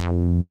F3 bass

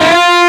bend full; osu

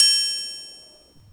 normal hitfinish

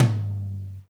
Low Tom

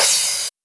soft hit finish; osu

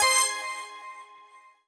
normal hit normal

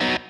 Staccato B

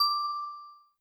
Key

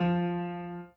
Piano Chord

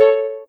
soft hitwhistle; osu

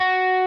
Arp.

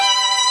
violin; osu

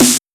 snare; osu

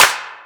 Main Clap Reverb

